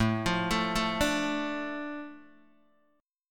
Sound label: A Suspended 4th Sharp 5th, Guitar DADGAD